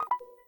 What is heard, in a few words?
Auto Power off; Samsung; Galaxy Camera EK-GC100